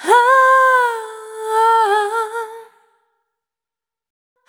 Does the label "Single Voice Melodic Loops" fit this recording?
Yes